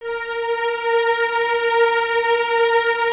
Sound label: Cellos, String Ensembles; A#4; Miroslav Vitous; LINKS ORCH